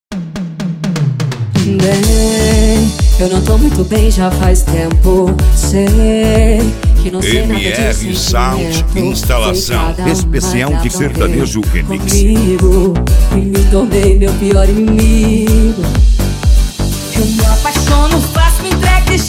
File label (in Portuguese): sertanejo remix